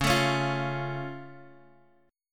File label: C sharp Augmented, C sharp, down, Guitar, Drop D